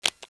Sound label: briefcase, office; Briefcase 2